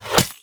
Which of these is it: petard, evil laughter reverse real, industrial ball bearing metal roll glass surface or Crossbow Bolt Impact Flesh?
Crossbow Bolt Impact Flesh